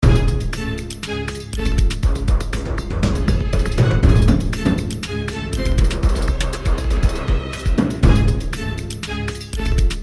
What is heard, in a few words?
Boss Battle Loop